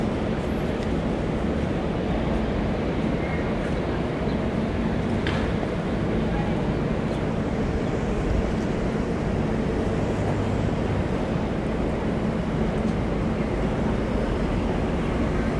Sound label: Oriental Rugs Gallery